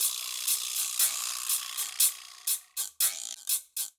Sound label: Wood B, Latin Percussion; Instrument Kits; Ableton; Factory Packs